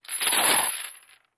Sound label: More Heavier Chain